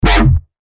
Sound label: Bass Shots F; Balmy; revealed bass shots vol 4